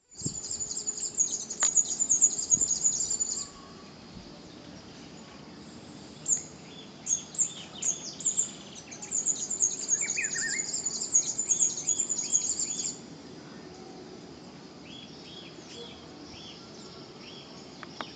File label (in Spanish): tanga cabeciazul, Tangara cyanicollis, Blue-necked tanager